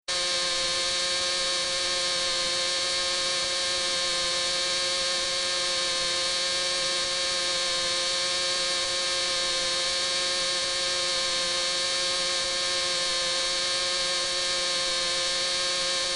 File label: good source of scratch